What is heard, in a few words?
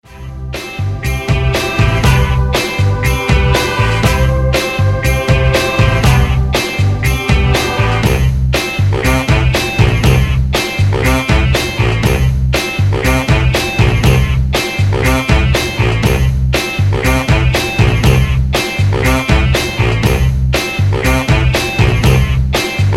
funky soul